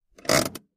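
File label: emergency brake set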